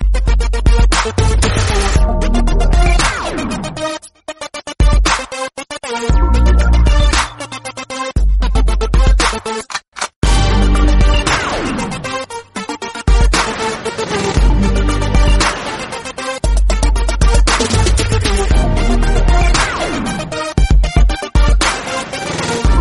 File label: Hindi Tune Ringtone